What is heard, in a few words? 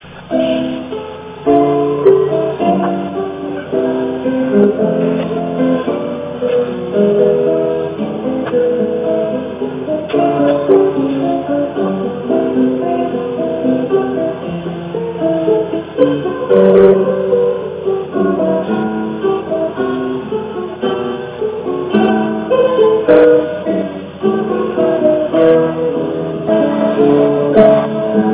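gavotte, guitar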